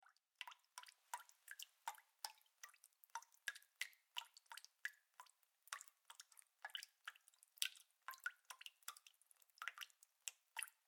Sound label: Water Drips Into Bucket Leak; Kitchen Sound Effects; Kitchen (Sound Effects:Household); SFX Bible 6